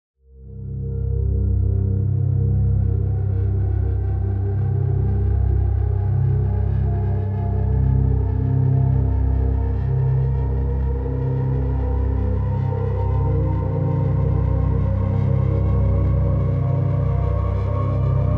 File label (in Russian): narastajushhee naprjazhenie